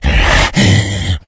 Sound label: become alert, infected; npc, alert